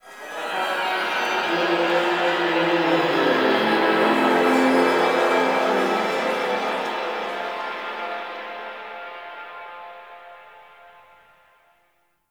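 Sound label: Zariman Amphitheatre Echoes, Ambience; Warframe; TheNewWar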